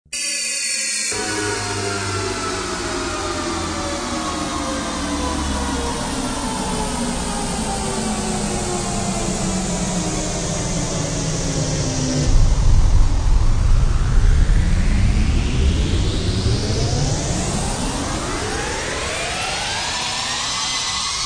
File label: memorymoog glide